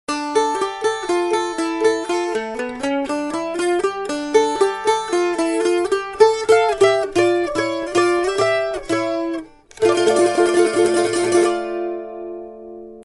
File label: BALALAIKA